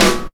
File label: good snare sample E key